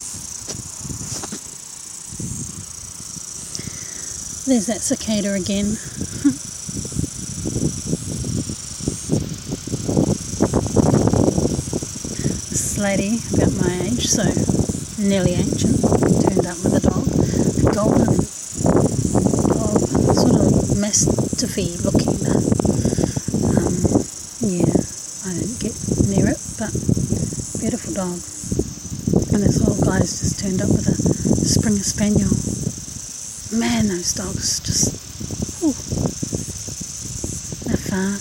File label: dogs and cicada rain